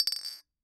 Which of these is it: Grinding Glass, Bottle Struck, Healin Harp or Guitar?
Bottle Struck